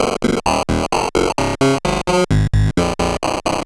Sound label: MiniBrute Loop, 130BPM